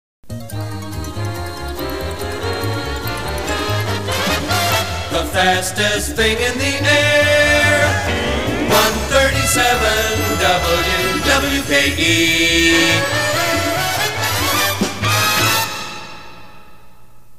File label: jingle Fastest Thing in the Air; WWKE